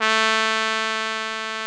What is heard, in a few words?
trumpet A2; Greytsounds VOL-1